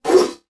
Multimedia Internet CD-Rom Flash Whoosh Short

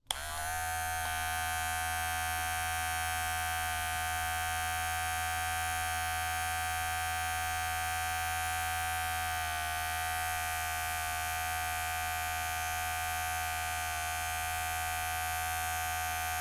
Industry Tool Trimmer Turn On And Off

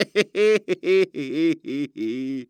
Cartoon Human Male Laugh Cartoon Laugh

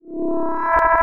Science Fiction Sci-Fi Electronic Stutter

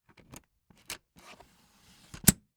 Household Bag Luggage Bag Handle Sliding Out

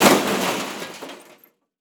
Crash Car With Slight Glass Debris; Crashes

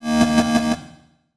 Transition Reverse Warble Reverse; Production Element

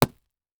Impact Plunger Wet Smacking Ground; Impacts

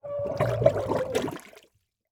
Liquid Water Water Scream Under Water Short

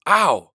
Human Male Ow

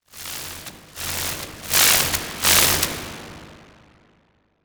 Transition Noise Static; Production Element

